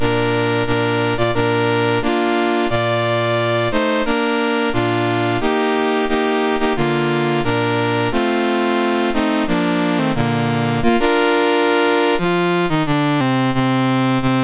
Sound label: Ethiopia, National Anthems, MOS 6581